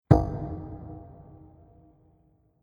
Single Dramatic Hit, impact; Explosion

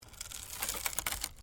WOOD PLANK TWIST

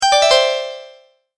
multimedia tone alert

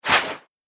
Texture Whoosh; Whoosh Sound Effects

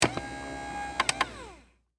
Servo Motor Short, Hospital, Bed; BlastWave

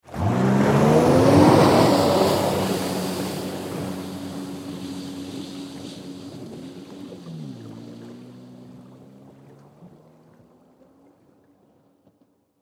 Jet Ski Away Fast, Watercraft; Transportation; Boats

